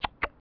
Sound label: slurp; TileWorld